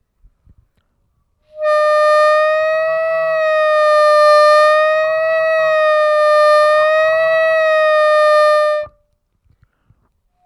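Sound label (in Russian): Бендинг на овербендах, губной гармошке; Удерживая овербенд, плавно играть более низкую, то более высокую ноту, на четвертом, пятом и шестом отверстии.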